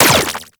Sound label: Laser Fire; Warframe; Corpus